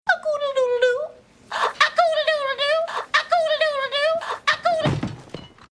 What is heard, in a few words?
chicken sounds; lucille bluth